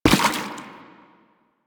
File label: Step Water, Footsteps